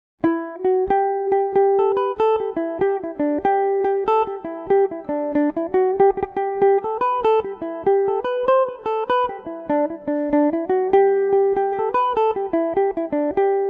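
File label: G major, irish mandolin; jimmy wards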